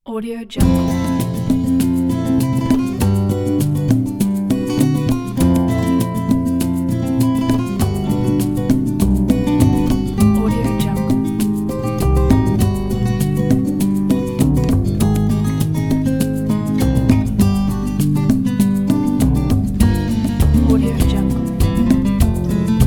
latin background

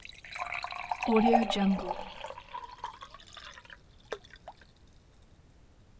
pouring water into a glass

